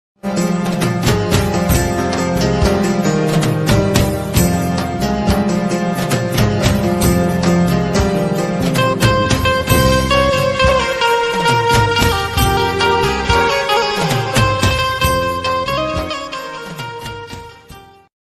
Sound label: ringtone